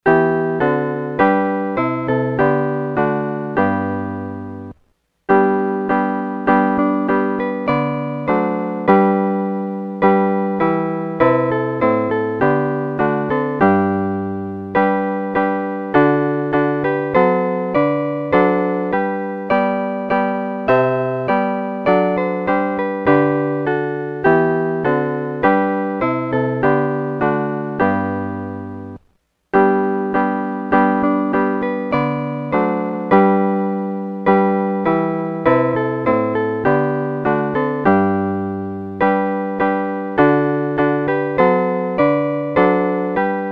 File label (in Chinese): 风琴合奏（四声部）; Ask Ye What Great Thing I Know; Johann C. Schwedler